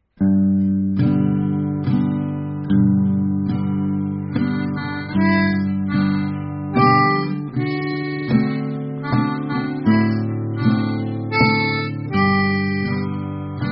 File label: high guitar